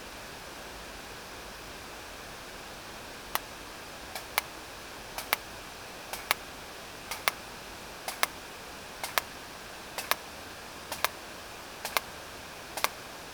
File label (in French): cachalot Bahamas